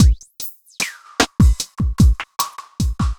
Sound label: evil beats 75